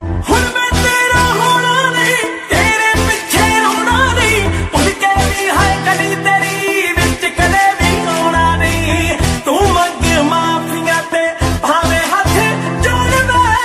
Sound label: bollywood punjabi remix ringtone